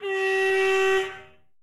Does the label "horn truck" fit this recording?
Yes